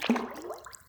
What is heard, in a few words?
Swim Variation